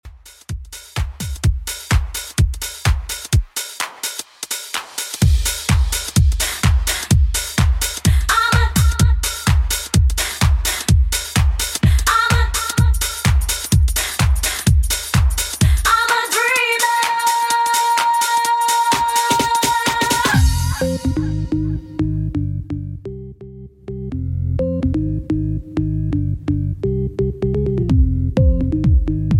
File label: 90s Redrum 127bpm